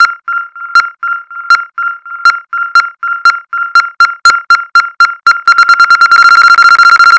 grenade pipe; cstrike; ZP CRISTIAN

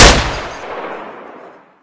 mp5 navy; cstrike; ZP CRISTIAN; ZPB WEAPONS

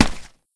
fall

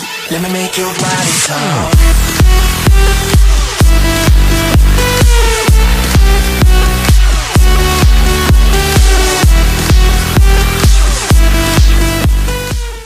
electro; roundsound

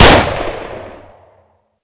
elite; cstrike; ZP CRISTIAN; ZPB WEAPONS

